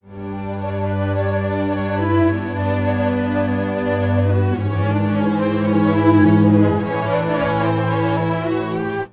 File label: strings, pitchshift; izotope radius